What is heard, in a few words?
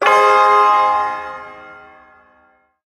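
Shawzin Minor Chord; Warframe; Duviri Ersatz Shawzin; Chords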